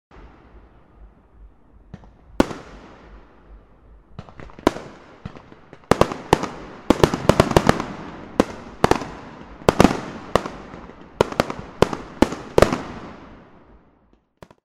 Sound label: Fireworks without Launch; Montego Bay